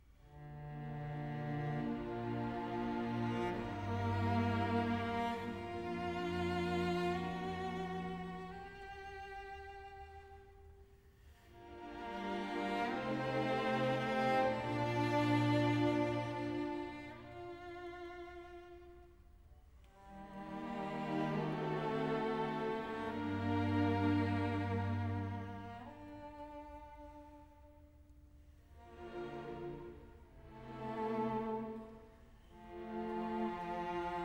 Concerto for Cello and Orchestra in E minor, Adagio